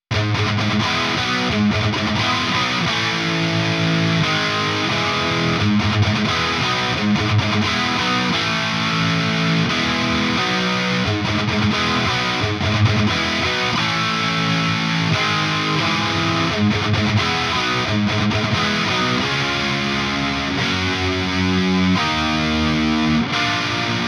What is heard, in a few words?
OVERDRIVE Saturn Stereo